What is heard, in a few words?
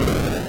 rank up; Super Hexagon